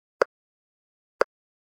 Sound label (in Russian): Метроном 60 ударов; kazan